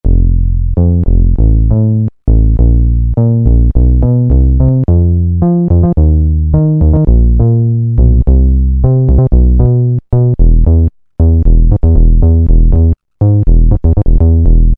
Bass Pluck, Roland Juno-106; Factory Preset

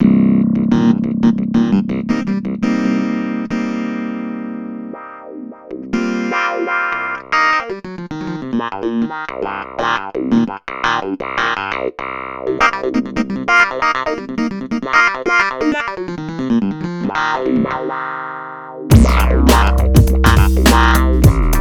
Dampr Wah Clav, Korg Radias-R

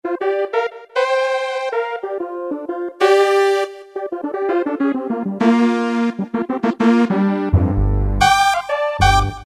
Pressure 5th Horns, Roland PN-D50-03

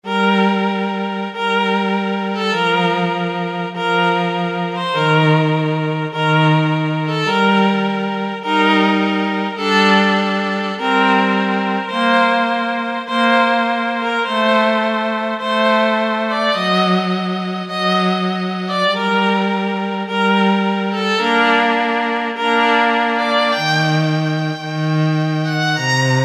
Slo Solo Strings, Kurzweil K2000; Kurzweil K2000 V3

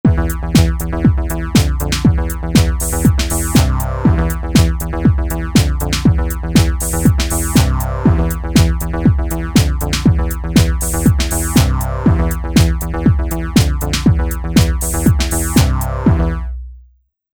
Techno

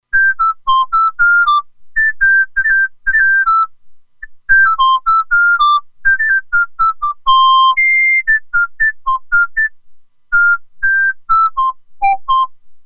Hiccolo, Roland Alpha Juno-2; Factory 2

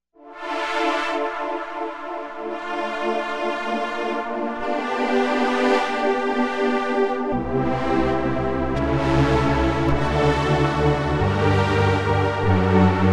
GX Ultra Pad

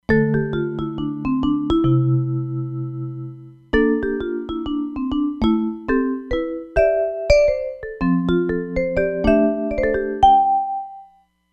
Ringy Vibes, Roland Fantom XR

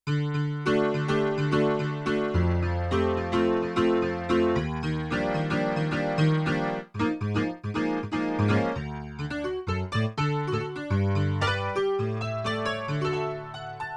Chorus Piano; Yamaha TX16W; Jazz Performance; Jazz Performance 2

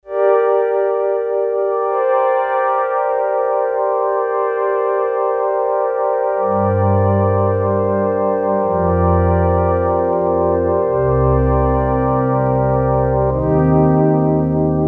Analog Pad, Korg DS-8; Factory Patches

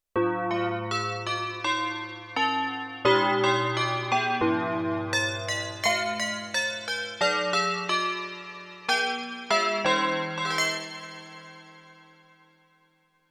DX-Bell, Yamaha TX16W

